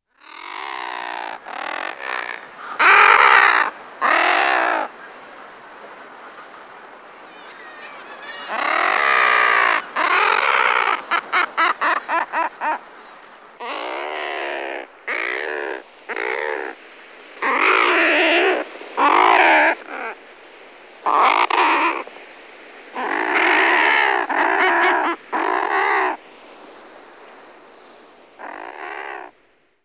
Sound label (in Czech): Alkoun tlustozoby Uria lomvia